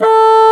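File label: WIND BASSOON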